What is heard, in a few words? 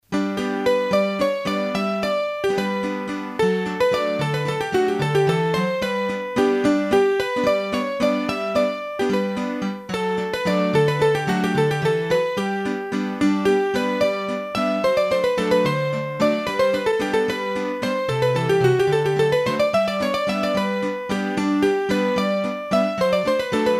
Valse, hurdy-gurdy; Massif Central